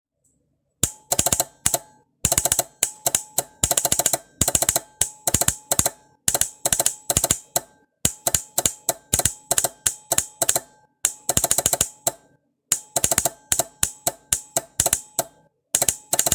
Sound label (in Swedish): Klapprande morse